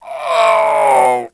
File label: death; mohaa; dialogue